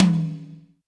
high tom E key, tom single hit tuned to the E note. Loudest frequency: 875Hz